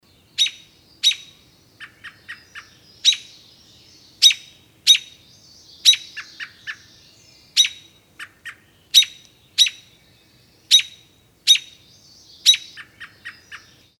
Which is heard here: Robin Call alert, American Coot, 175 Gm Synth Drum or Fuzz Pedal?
Robin Call alert